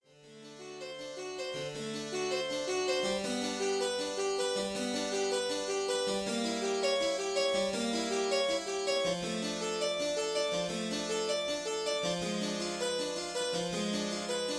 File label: harpsichord; Bach prelude n.1